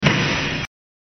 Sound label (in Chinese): gundong